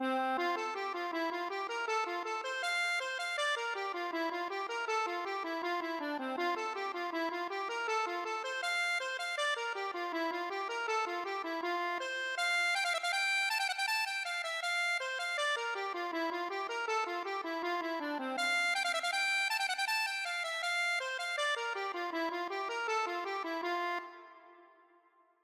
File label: accordion